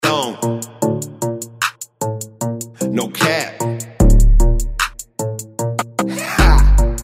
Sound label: BVOX; Clean